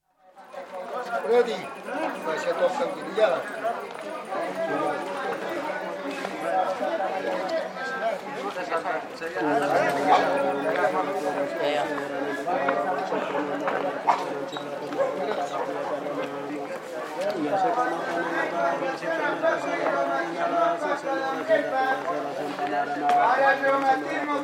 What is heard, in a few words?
Street, Cliza, on market day with cries from hawkers, footsteps and bells and chanting from blind fortune tellers, no traffic; Stores And Mall; BBC